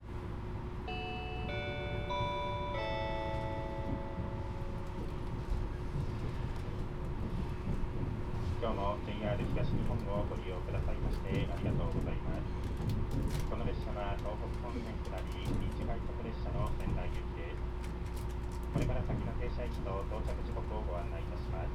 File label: jr tohoku extra rapid train